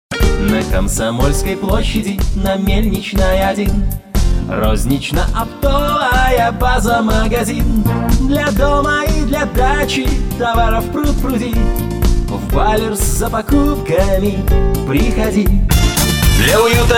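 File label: vocal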